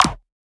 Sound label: Perc, Percussion; FLPRO